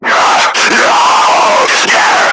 Screamer Idle; Warframe; Lasrian

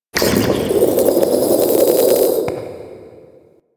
interior gurgle slurps; Ambience; Misc Indoor; DE; Warframe; Infested Eris

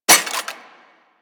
Burst Rifle Mech; Weapons; Grineer

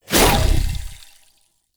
Sword Board Throw, Whooshes; Weapons; Melee; Warframe; Pacifist Sword And Board

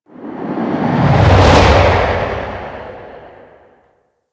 Fortress Asteroid Whoosh

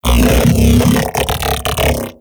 Mech Ambient Vocal

